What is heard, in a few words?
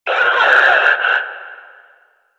Death, Enemy, Barks, Battle; Warframe; Lasrian; Lasrian Enemy C